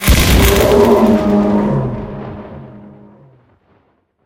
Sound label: mpoltergeist death; STALKER; customnpcs